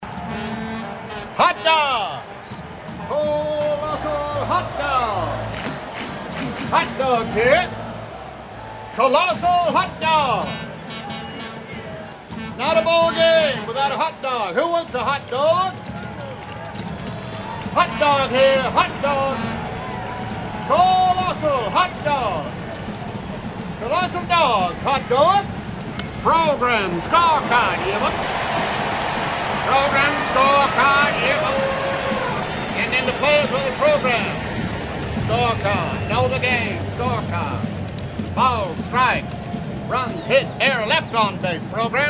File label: Crowd Sounds; Guest Hawker Tom Hanks; Tom Hanks Loop